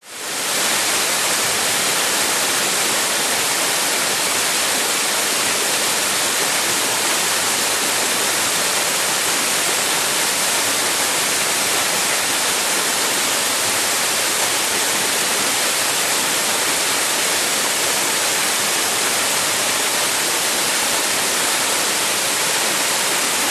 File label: nature waterfall medium into lagoon rainforest; zapsplat